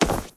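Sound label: item generic up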